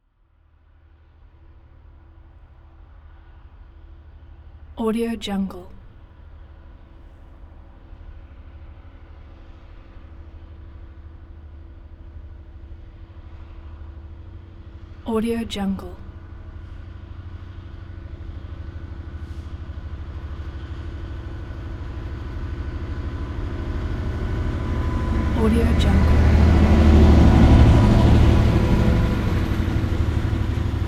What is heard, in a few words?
train engine wagons passing